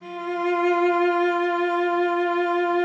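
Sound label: F4, cello vibrato; Xsample VOL-7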